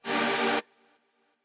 chord

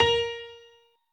piano

